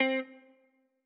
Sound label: Guitar; MPC expansion